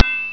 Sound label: ANVIL